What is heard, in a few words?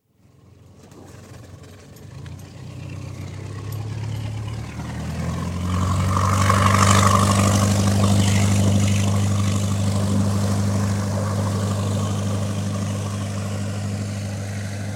Cabin Cruiser, depart (Recorded on shore) (27ft, 50hp diesel engine); Boat Sound Effects; BBC